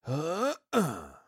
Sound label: subnotification